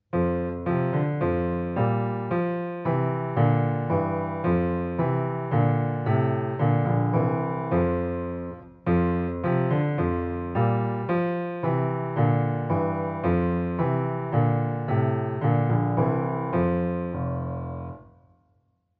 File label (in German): F Schlüssel Lied